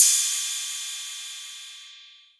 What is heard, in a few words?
Cymbal, Novation Drumstation; DrumstationV1